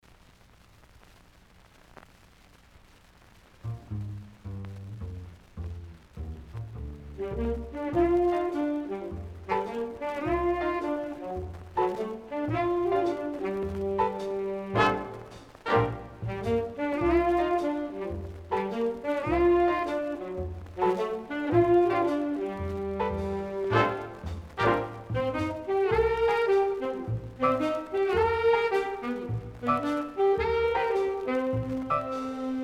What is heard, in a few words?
Jazz Filtered